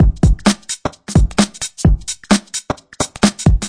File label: twostep skipper, drum loops and breaks